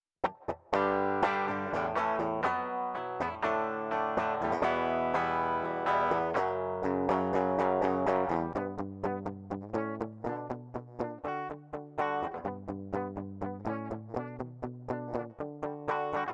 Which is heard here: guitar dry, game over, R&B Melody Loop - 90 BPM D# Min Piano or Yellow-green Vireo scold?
guitar dry